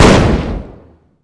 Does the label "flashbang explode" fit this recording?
Yes